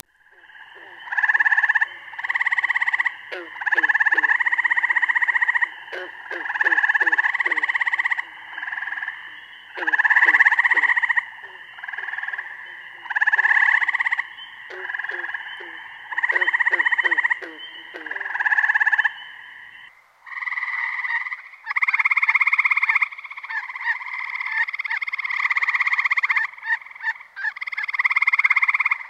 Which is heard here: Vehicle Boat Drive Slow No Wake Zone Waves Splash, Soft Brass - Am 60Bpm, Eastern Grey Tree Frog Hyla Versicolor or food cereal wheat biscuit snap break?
Eastern Grey Tree Frog Hyla Versicolor